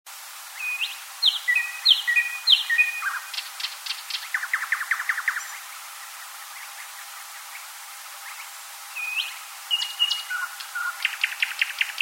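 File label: thrush nightingale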